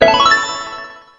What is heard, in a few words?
slot machine win